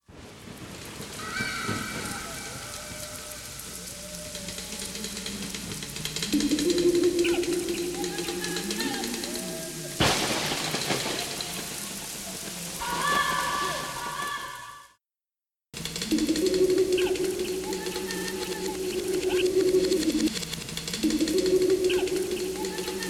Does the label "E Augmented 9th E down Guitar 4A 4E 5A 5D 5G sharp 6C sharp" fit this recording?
No